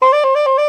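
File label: trill C-D, soprano sax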